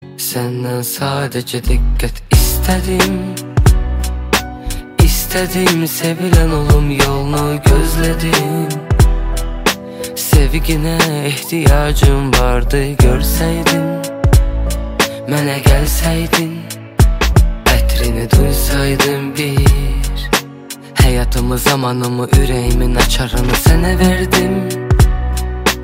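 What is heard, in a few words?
ringtone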